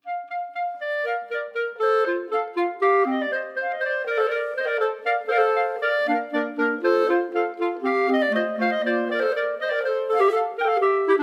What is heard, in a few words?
Concerto a 4 in Bb for Bb Clarinets Allegro complete version; Telemann